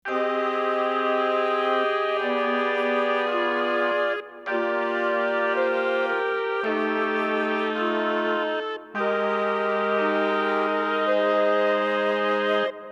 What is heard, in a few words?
Chamber Winds; mellotron